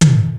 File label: DRUMS; Rubber Chicken Software Sample Bank